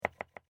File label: Tap Sequence, User interface feedback sounds; Tones; Sound Design